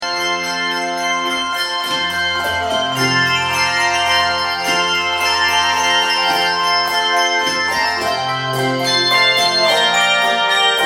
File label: Allegro Giocoso, ringers; Water Music